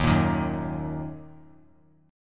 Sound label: Eb Minor Eb 3C 3F 3A 3D; Ebm strummed; JGuitar